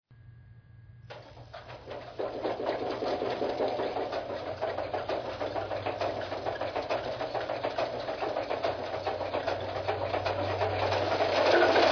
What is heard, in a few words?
RS-1, trains